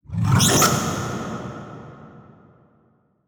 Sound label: Transition Hit Impact; Production Element